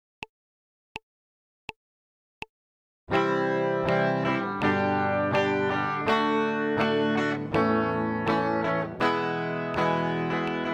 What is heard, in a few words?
strum, guitar